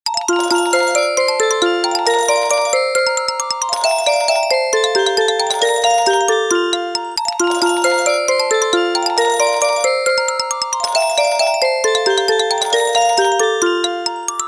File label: Light Tel, ringtones; Coolpad